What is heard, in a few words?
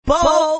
bowl